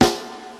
Wet Snare Drum F# Key, snare drum tuned to the F# note